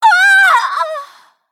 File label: Female Night Elf Death